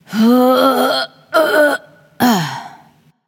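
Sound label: grunt lift, female; smite; voicepack; hera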